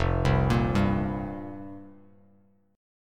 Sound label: F Suspended 2nd Suspended 4th F down Bass Standard; arpeggiated; JGuitar